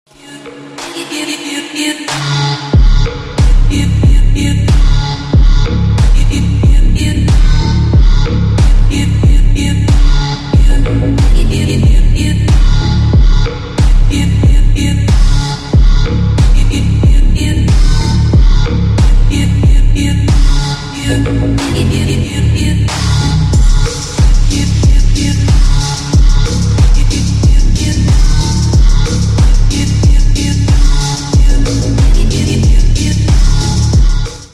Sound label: Slowed & Reverb